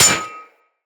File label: Melee Axe Impact Generic